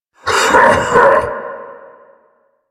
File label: Heavy Laugh; Warframe; Dax